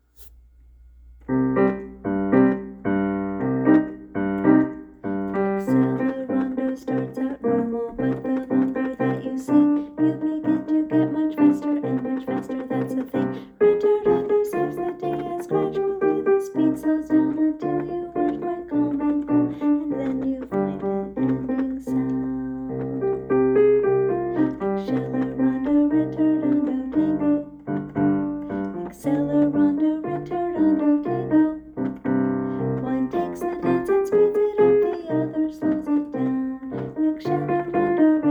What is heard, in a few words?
accelerando ritardando tango